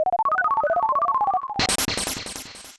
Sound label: Paralysis; mother2